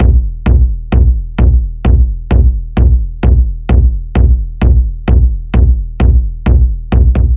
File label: Disto Kick Loop 130bpm